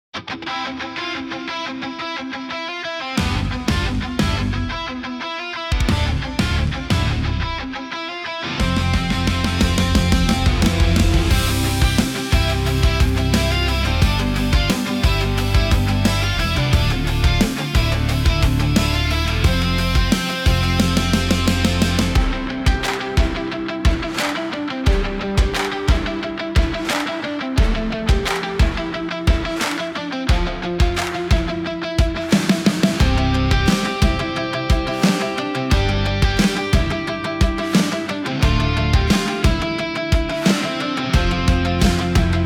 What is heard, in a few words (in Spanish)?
instrumental